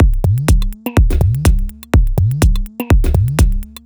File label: 124bpm, House Drumloops; Zero-G House Fabrik Drumloops